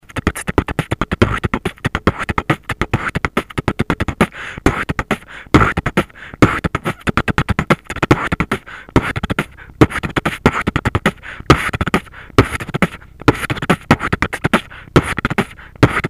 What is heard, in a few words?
beatbox